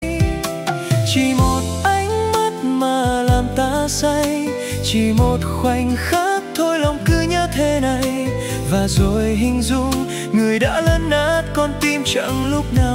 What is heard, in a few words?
remix